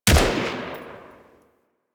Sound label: Braton Vandal Redux Fire Shot; Warframe; Weapons